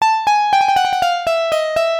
gypsy lick

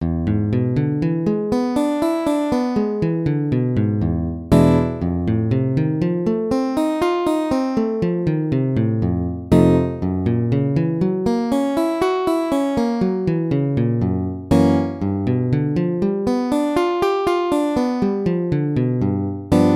7th arpeggio daily; gipsy jazz

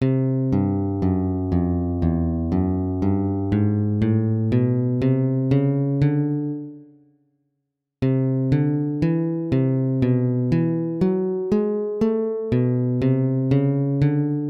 running bass example

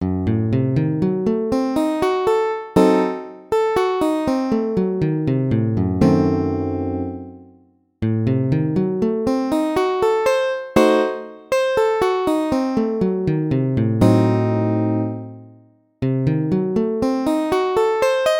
A dim arpeggio

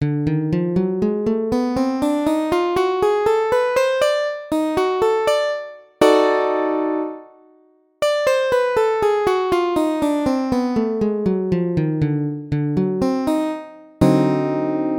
D7b9 scale

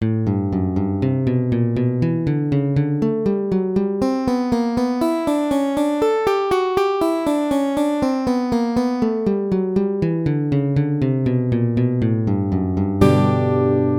key G enclosure; gipsy jazz; エンクロージャー

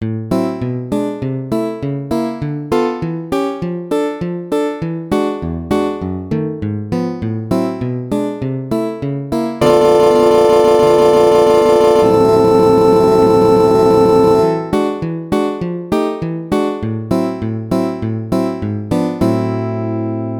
impro backing

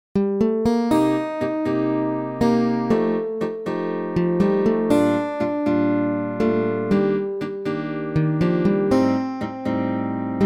melody, gipsy jazz

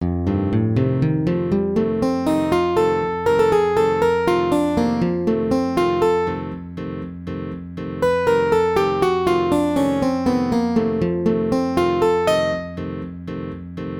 F7 licks arpeggio; gipsy jazz

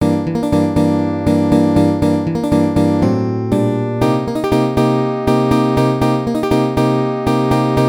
backing patern

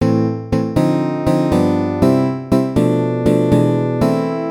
turn around example; gipsy jazz; ターンアラウンド

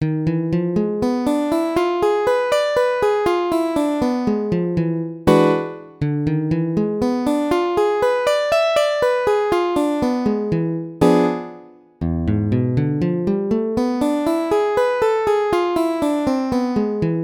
E7 gypsy scale

